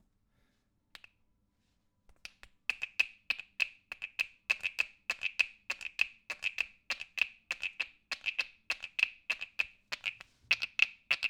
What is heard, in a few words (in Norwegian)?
frosk; logic